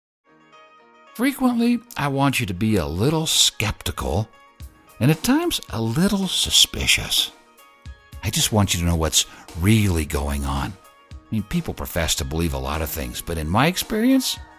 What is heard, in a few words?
music